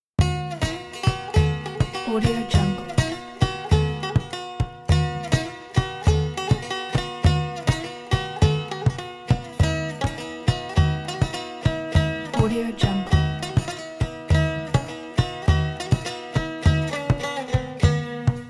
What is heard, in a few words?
turkish ramadan bouzouki saz and daff; prosoundlab